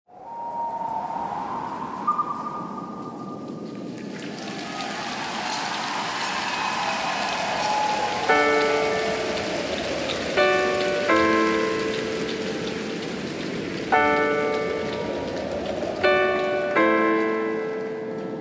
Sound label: melodiya acoustic live